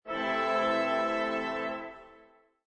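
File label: Brass Min Chord, Brass section of the orchestra playing a minor chord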